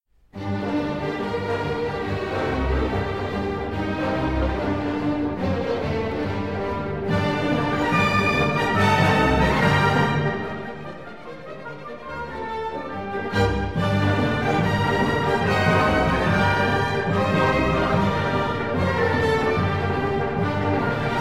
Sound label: hungarian dance; brahnus